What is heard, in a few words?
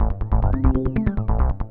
SNTH BASS ELCTR 140 A, Techno